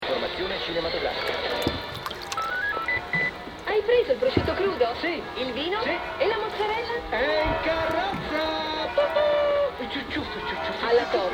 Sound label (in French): sirene